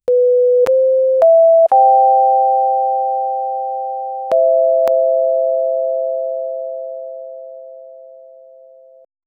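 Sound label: a smooth clean digital tone, short decay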